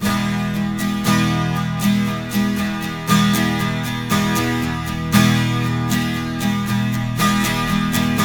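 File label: 12 string major F, Guitars; Spectrasonics; Hans Zimmer Guitars VOL-1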